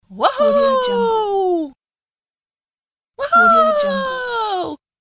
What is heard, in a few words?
cartoon female cheers